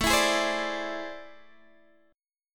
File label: A Minor Major 11th A, Guitar, DADGAD